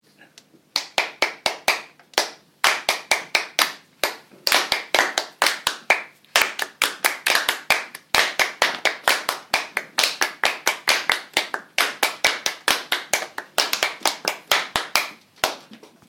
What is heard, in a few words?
rhythm grid